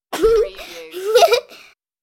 Child Laugh; beanstalk audio

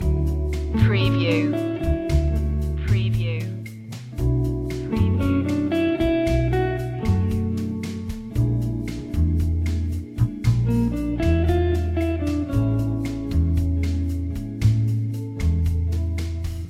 bossa melody loop; chill out music

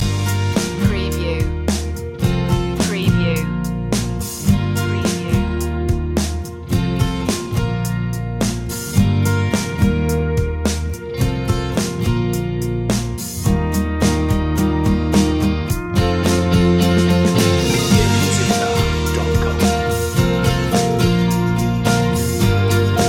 brighter day loop

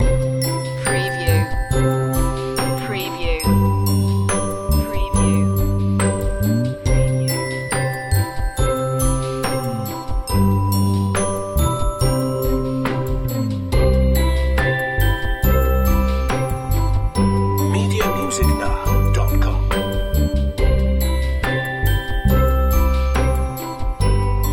lullaby beat

